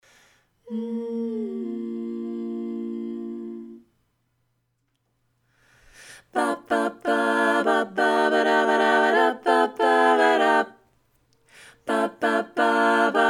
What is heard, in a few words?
Lead muted, harmony